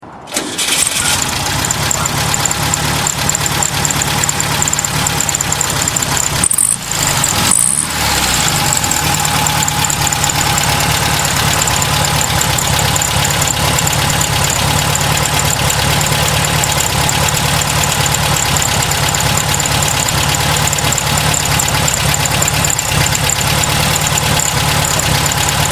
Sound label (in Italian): vw maggiolino